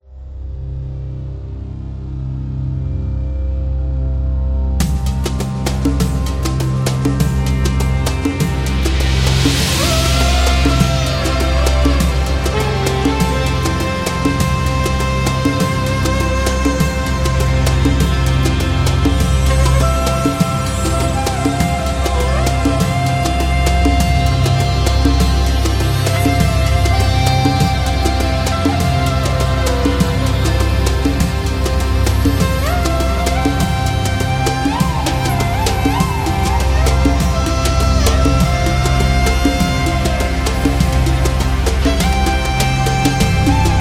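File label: Saudi, epic cinematic trailer music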